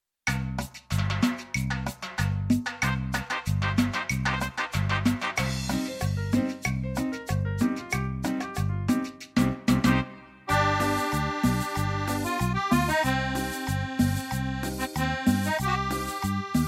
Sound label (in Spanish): Cumbia; ritmos